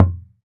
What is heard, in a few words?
Wadon, Roland BK-5 Percussion